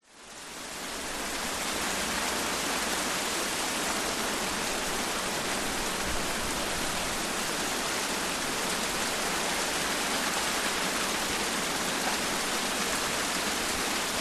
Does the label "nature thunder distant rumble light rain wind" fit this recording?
Yes